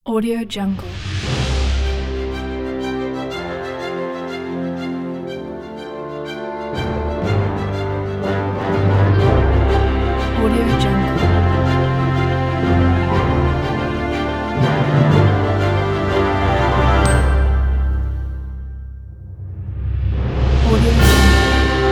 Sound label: adventure epic